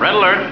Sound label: red alert